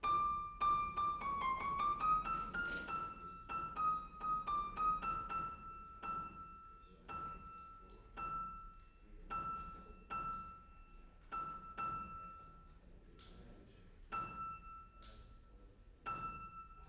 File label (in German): Klavierstimmung; Lexikon-Roman